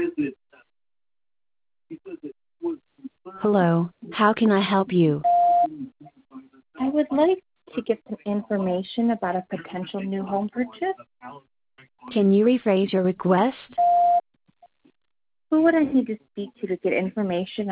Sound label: Background Noise; Frozen